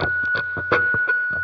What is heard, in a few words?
keys, jazz loops